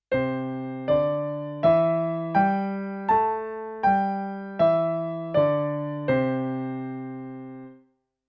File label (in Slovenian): pentatonika